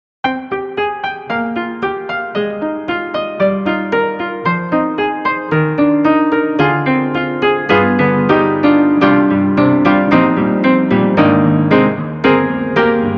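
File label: Upright Sixties Mute, piano; Spectrasonics Keyscape; Wing Upright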